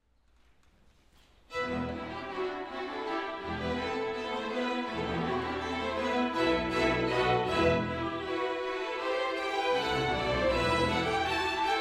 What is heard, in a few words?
Fanfare